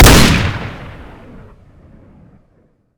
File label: shell shock shoot